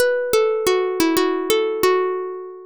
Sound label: plucked